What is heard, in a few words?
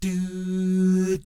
DOO, Vocal Planet Jazz